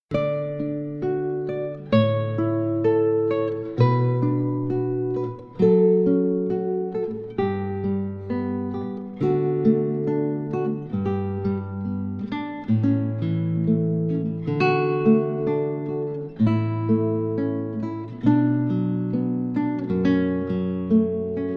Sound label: Canon